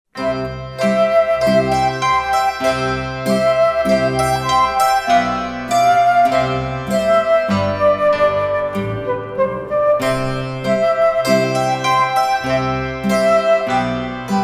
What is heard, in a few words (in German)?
Orgel; Orgel-Bayrischer